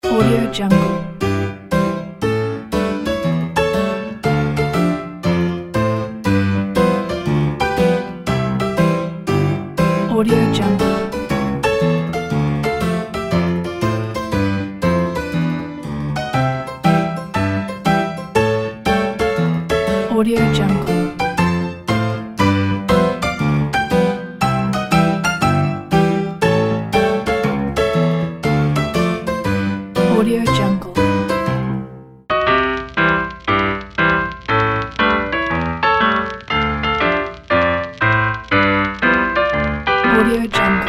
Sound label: vintage ragtime loop